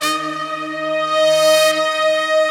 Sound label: big brass, Slow Big Brass